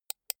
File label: computer mouse right click soft